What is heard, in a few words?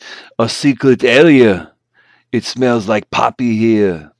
secret area; sadpuppy; fakeArne